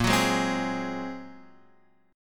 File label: A sharp 7th Suspended 2nd Sharp 5th A sharp, Guitar Half Step Down; chord